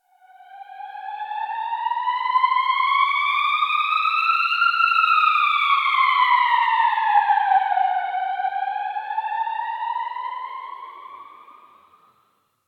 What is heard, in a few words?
Tunnel Distant Siren